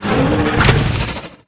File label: attack